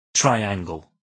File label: triangle